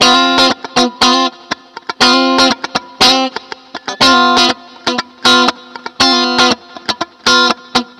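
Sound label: GIT-CHRDLICK, guitar chord lick, Disco; Best Service ProSamples vol.48 - Disco Fever; AKAI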